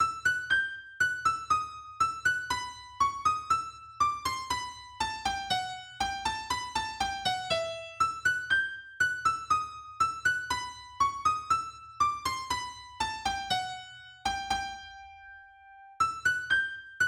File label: Bourree In Em Solo EGit; Bach